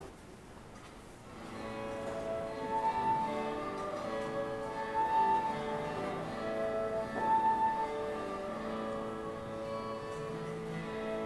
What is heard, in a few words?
Silent Night Instrumental; Post-Communion; mass